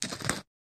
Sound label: Grinding Pepper Mill, Single Grind